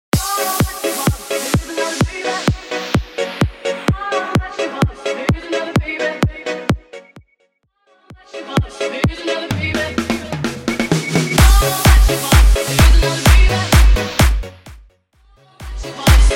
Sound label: 90s Bounce Party Starter Clap Hands 128